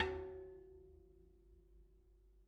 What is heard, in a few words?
Bicycle Spoke